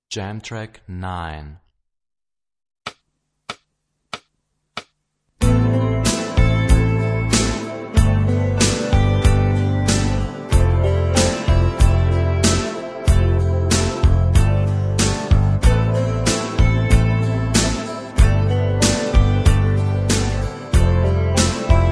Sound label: Rock guitar; Rock gt Secrets